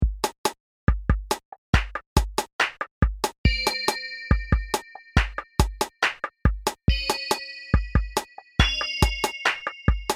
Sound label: Bell Exercise